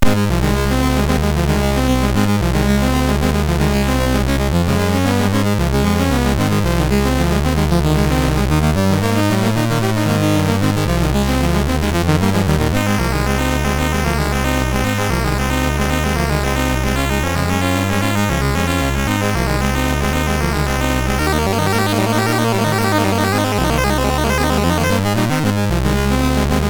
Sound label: Super Cup Football, MOS6581R3; hvsc